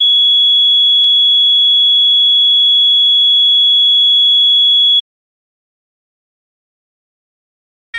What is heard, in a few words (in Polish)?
ton